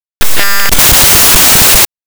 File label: glitch